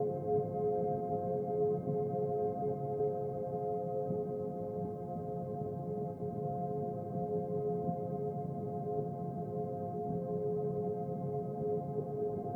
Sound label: Airpad